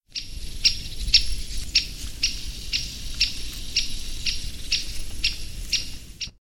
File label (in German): Buntspecht; Picoides major; Spechte